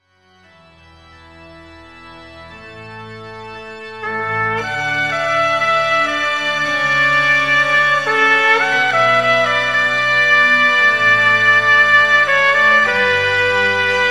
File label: Oboe